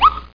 default beep; road runner

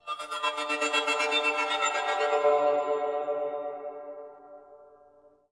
panner hi (L); DirectX SDK; DMusProd

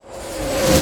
explosion lead in; 1944 Battle Of The Bulge

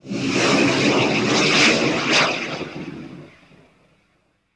m136 fly; The Sum of All Fears

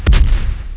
pulser; The SoundBlaster Multi Media Experience vol 4

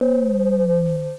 scan; Peripheral Empires

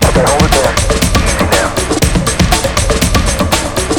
loop; The SoundBlaster Multi Media Experience vol 4

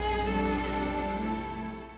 creature question

